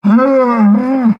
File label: Pet Buffalo Beg; creatures pets; Lego